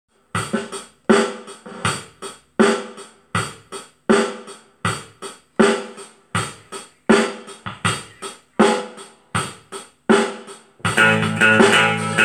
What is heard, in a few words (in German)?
blues solo 6 e drum backing track 90bpm